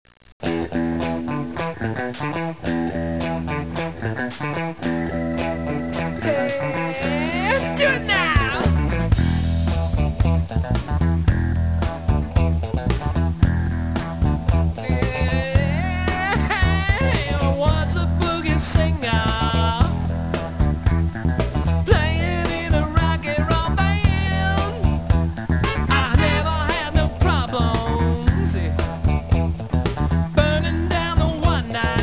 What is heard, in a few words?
funky, Orchestra